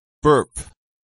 burp

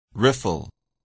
riffle

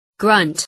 grunt

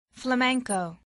flamenco

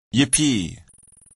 yippee

